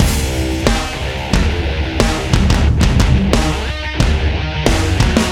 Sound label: ROCK TONE, ringtones; Google; NewWave Labs